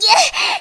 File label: resistance push